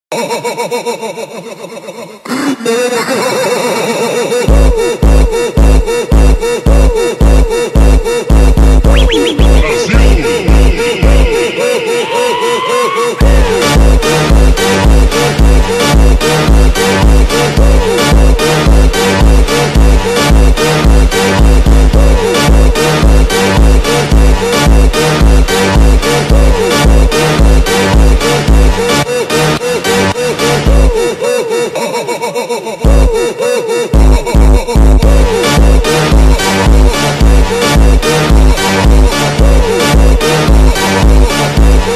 RUSH LAUGH FUNK

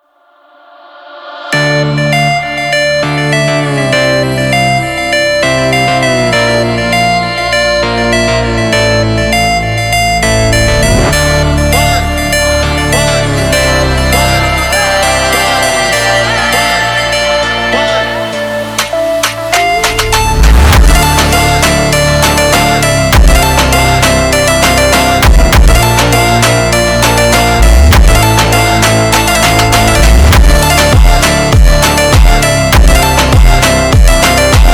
Phonk

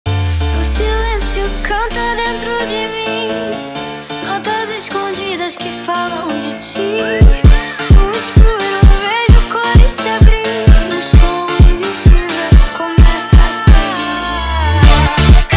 Phonk

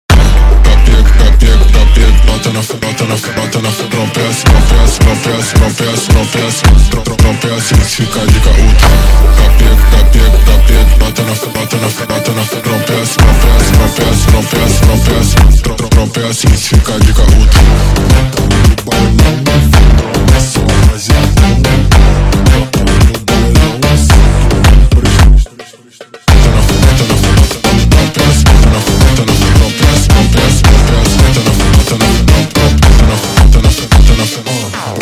Phonk MONTAGEM

